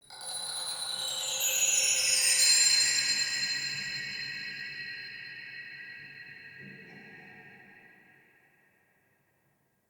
Root Cave Magical Chimes; Ambience; Neathuns Root Cave

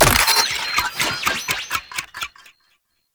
Security Credentials Activate; Shadowgrapher

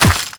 Run Dirt, Footsteps; Warframe; The New War; Characters; Kahl

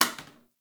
Janitor Plastic Spray Bottle; Warframe; Ambience; NineteenNinetyNine

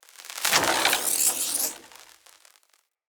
Voltaic Weapon Electricity Whoosh; Weapons; Enhancements; Elemental

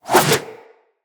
Generic Backflip Whoosh, Generic Foley; Characters

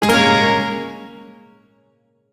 Shawzin Phrygian Chord; Music; Warframe; Duviri; Chords

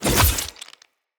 Short Sword Stealth Attack Stab; Weapons; Finishers

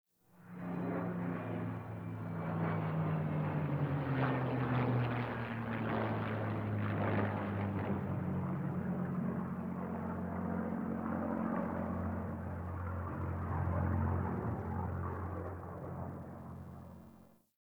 Distant Ambient Fighter Jet

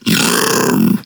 Infested Splitter Split Top Vocal Attack Medium

